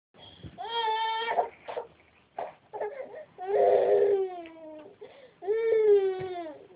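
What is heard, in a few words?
baby hungry, female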